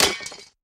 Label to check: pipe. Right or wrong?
Wrong